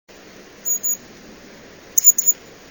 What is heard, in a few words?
aegithalos caudatus